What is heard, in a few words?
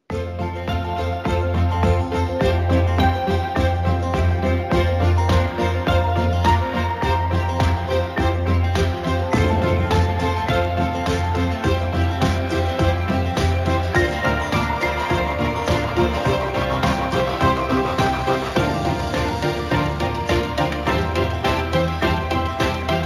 Teams Music